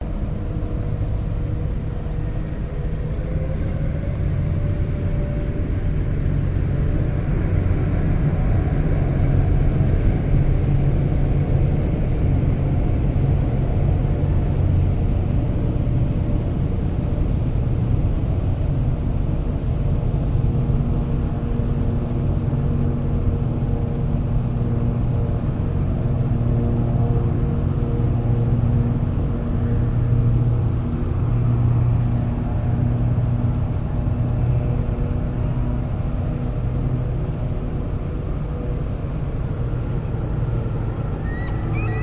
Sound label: market dock seabus seagulls boats planes water honking; vancouver; lonsdale quay; metallic trolley; your free sounds